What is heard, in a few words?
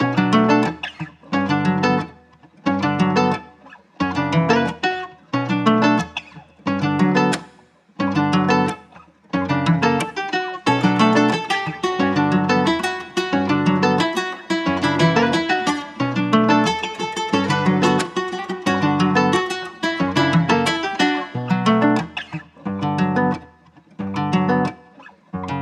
Fields, 90 BPM B Min, Guitar Loops; Cymatics; Journey Guitar Loops